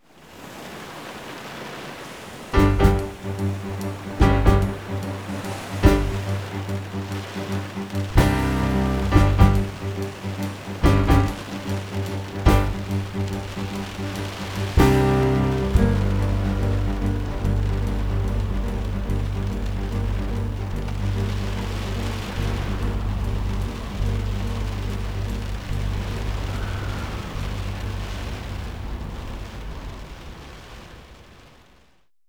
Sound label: storm